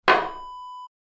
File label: toilet seat cover up